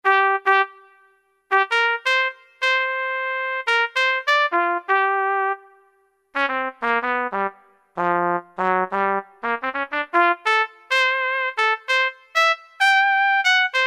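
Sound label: brass Solo Trumpet, E-mu PK-6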